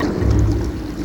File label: Cavernous Splash; Sound FX